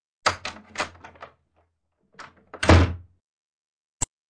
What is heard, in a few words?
Track, Doors; 헐리웃특수효과음; 문 소리